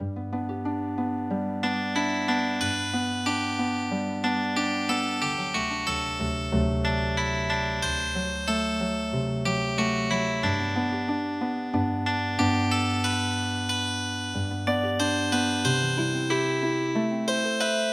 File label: Healing Harp, traditional